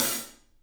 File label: PAISTE HAT, Drums; 3D Sound